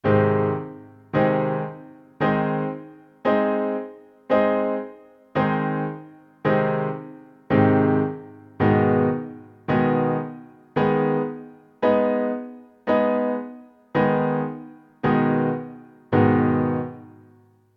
triad and inversion block chord in A flat and A flat m